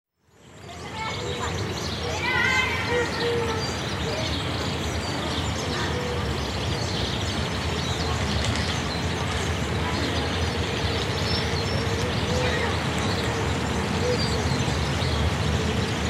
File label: Park Noise Concession